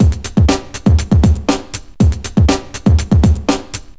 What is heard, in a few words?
Live minimal, Breaks